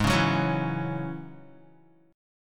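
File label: A flat 7th Suspended 4th Sharp 5th A flat Guitar, chord; Ab7sus4#5 strummed; 4,4,4,6,4,x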